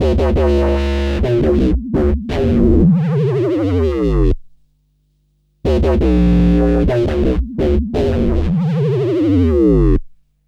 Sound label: Fuzz Bass, Drum & Bass; Fluid Dynamics